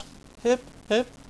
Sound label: hip hip